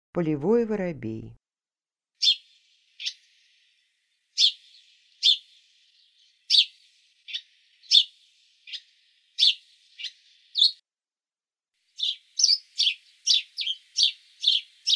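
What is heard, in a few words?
polevoy vorobey golos, tree sparrow voice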